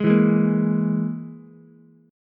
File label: Gsharp Suspended 2nd Sharp 5th, Ukulele; strummed; 1,4,4,1